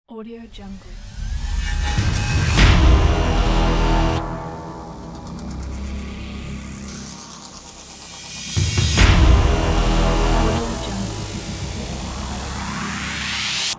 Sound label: Trailer